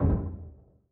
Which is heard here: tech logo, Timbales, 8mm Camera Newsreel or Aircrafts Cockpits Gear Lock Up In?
Aircrafts Cockpits Gear Lock Up In